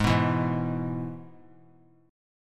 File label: Ab Minor Sharp 5th Ab down; Abm#5 strummed; JGuitar